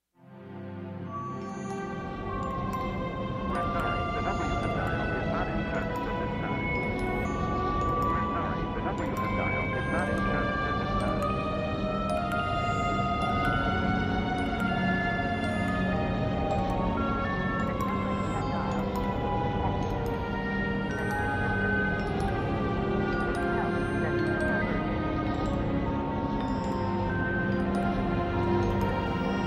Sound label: bgm ensemble